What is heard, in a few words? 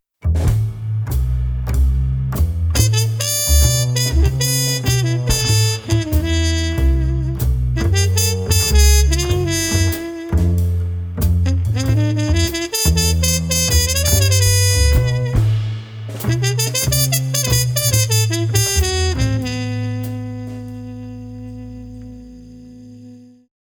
jazz drummer swing; drumstudio hamburg; filmmusik theatermusiker